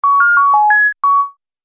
Multimedia Music

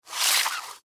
Sticky Note Package Slide Post-It; Miscellaneous Office; Blastwave

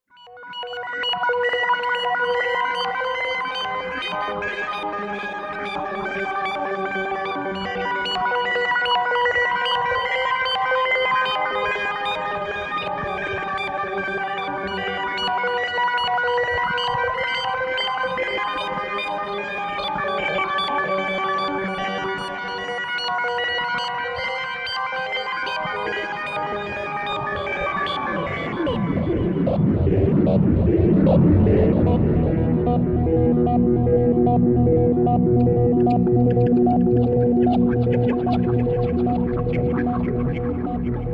Drone SciFi Musical Arpeggio Otherworldly; Drone Scifi Musical Otherworldly Arpeggio High - Tech Futuristic; Musical Sound Design

